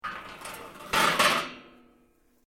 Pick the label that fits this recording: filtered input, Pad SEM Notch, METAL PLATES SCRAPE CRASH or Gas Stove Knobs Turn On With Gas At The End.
METAL PLATES SCRAPE CRASH